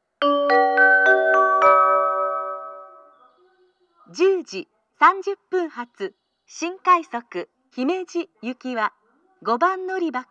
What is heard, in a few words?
special rapid service, kyoto station; himeji